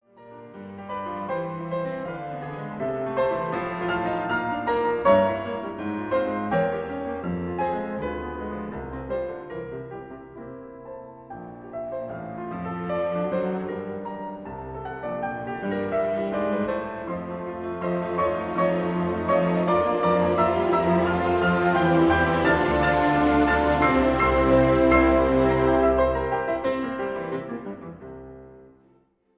piano concerto no 1 in d minor rondo allegro non troppo; brahms; op 15